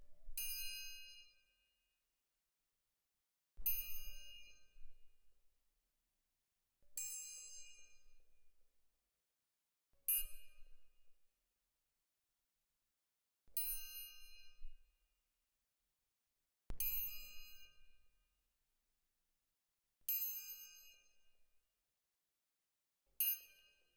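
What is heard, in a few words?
Percussion, Jazz for piano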